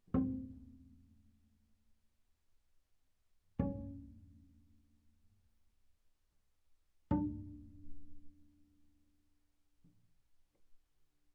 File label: Bass pizz sul D ff C4 D4, double bass